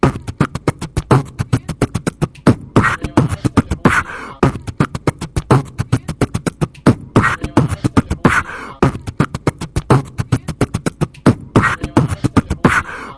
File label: human beatbox